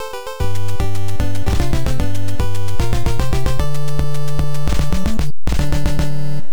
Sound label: Over (Game Over); Jackal; NES